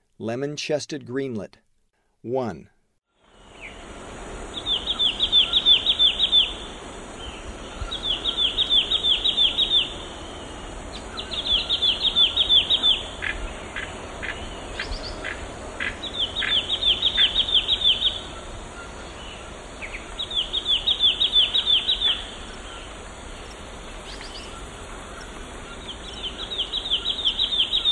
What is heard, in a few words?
Lemon-chested Greenlet; S. America; Eastern Lowlands